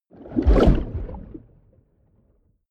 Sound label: whoosh submerged abstract fast short